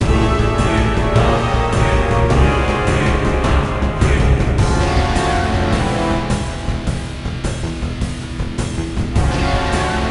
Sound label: Sting Norse Battle; Age of Empires Mythologies; NDS